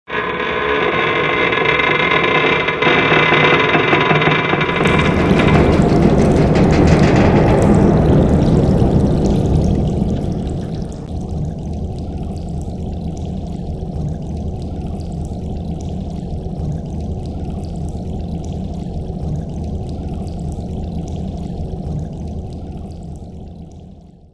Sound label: ambience